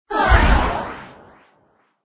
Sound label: black widow attack voice